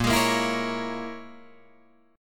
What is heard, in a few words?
Bb Minor 7th Flat 5th, Guitar, Drop D